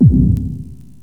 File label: Puck Bashed Bounce